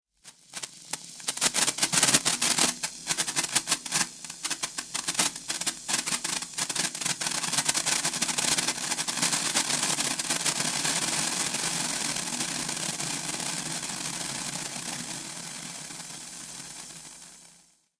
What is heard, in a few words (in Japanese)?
線香花火